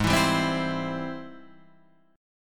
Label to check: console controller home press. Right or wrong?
Wrong